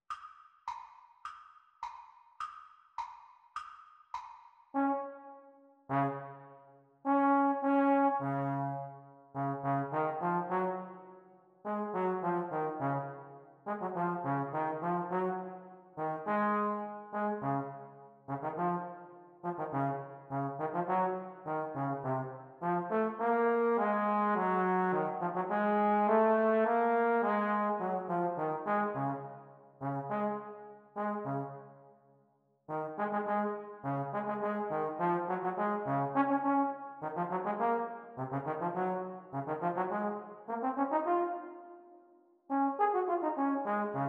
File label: Playalong for Eb Horn Exam Speed; Eb Tenor Horn